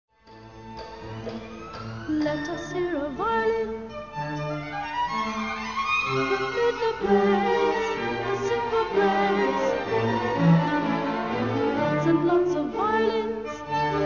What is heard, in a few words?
C violin